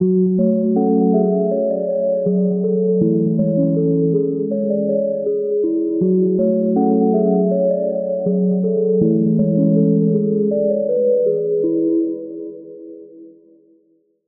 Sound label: Key; Erased Traces; Routed Sound; Vital Expansion - Street Vanquisher Vol.1